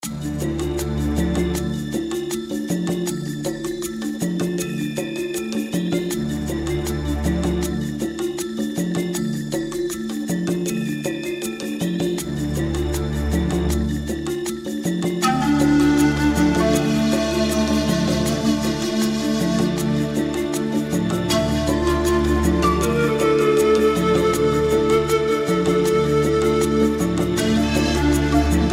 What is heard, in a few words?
DEEP INDIAN FOREST